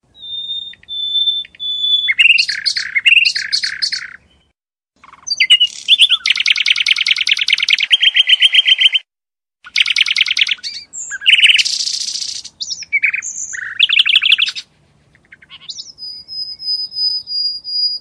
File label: Bird Music